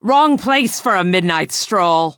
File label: attack; barrow stalker